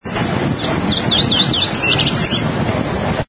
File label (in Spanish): voz arañero cara negra